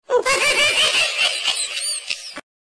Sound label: goofy sound chipmunk sound